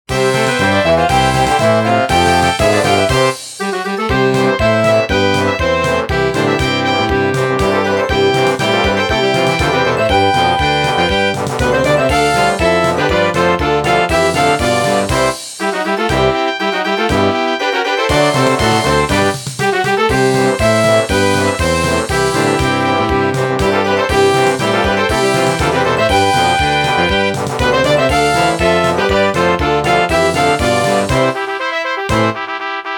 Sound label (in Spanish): Marcialidad marcha militar